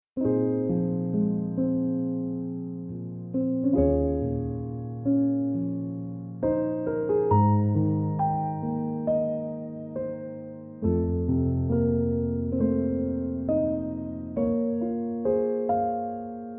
piano instrumental

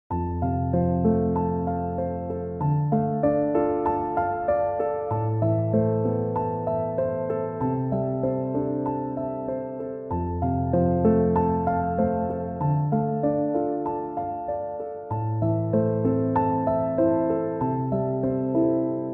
piano instrumental

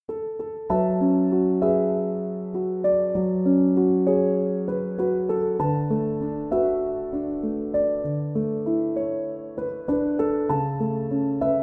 piano instrumental

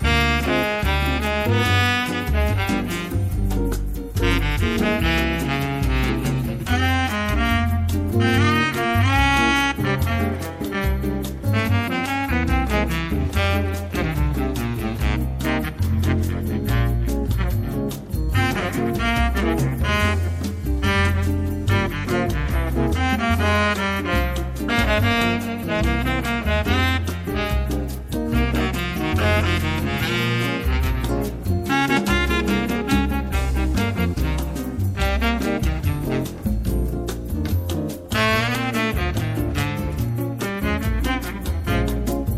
Bossa Nova Quartet with Baritone Saxophone Solo; JAZZ

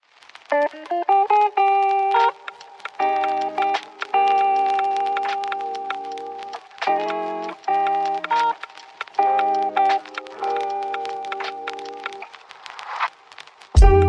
beats Disruptive LoFi

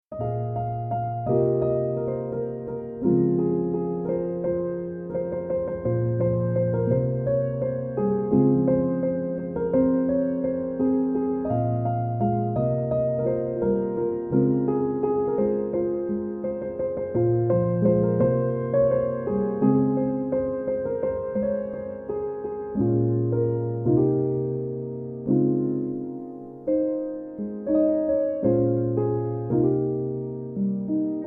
Piano Instrumental

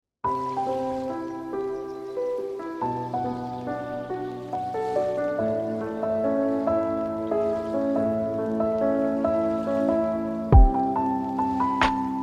instrumental lofi

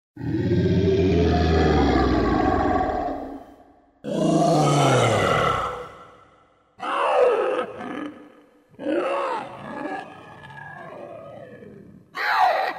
The Evil Sneeze; SONIDOS TERROR

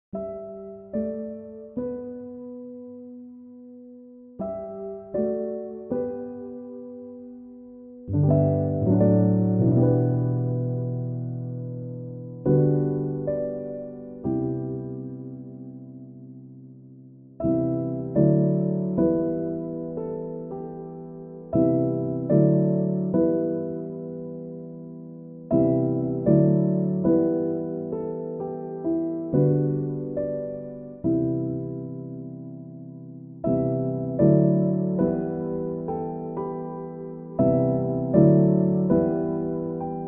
chill piano instrumental

